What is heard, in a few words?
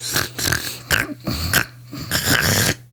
Snores, Daughter, Vocalizations; Warframe; Deimos